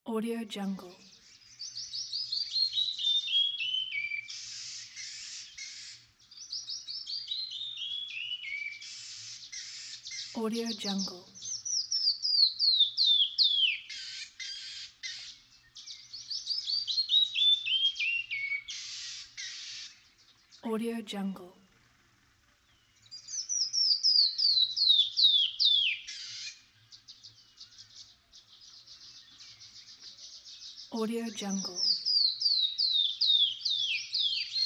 days weather

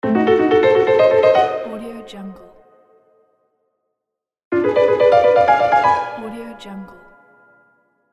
cartoon suspense

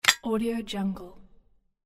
open metal lantern

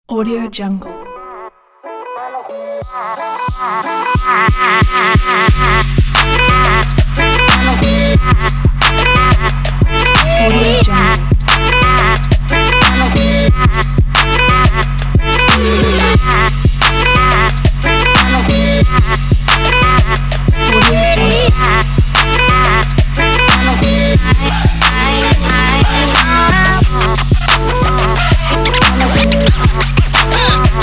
hiphop for hiphop

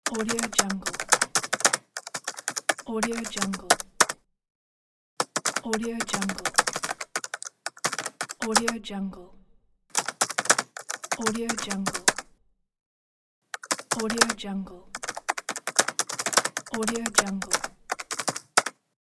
keyboard typing set; tomeqs

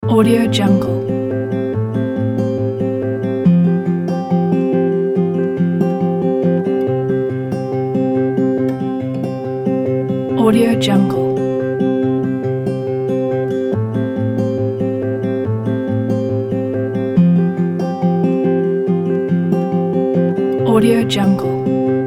folk piano; music waves production

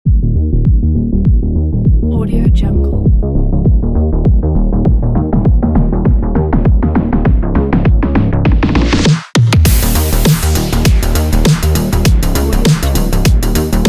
sport force

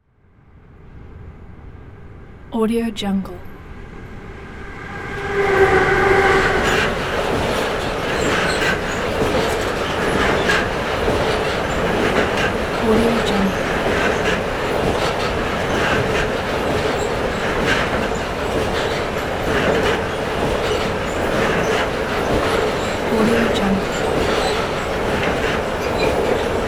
long freight trains pack

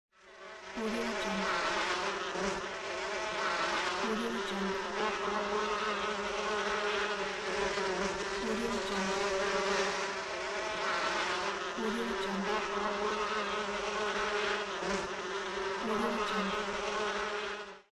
the swarm

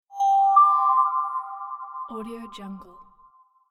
magic sms notification

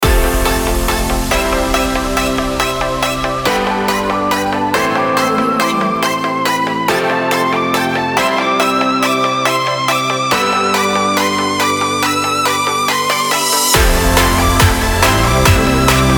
uplifting dubstep kit

